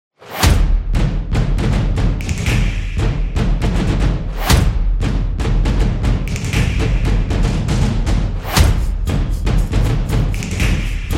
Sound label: Stomp Upbeat; graffiti